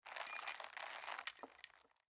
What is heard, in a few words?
boar pack jingle; npc; courier